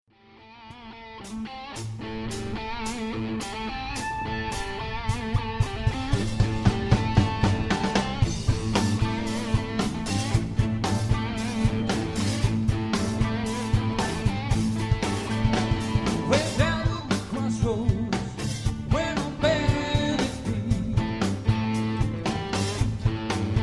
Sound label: Crossroads, Dance